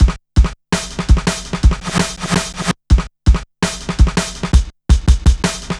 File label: Shuffling Live, Jungle Drum Loops